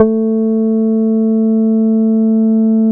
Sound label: A3, MATRIX 12, Vintage Keyboards; Big Fish Audio - First Call Vintage Keyboards